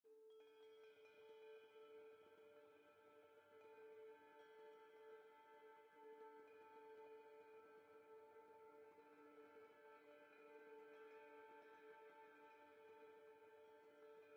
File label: tonal Amb Bell Spiritual D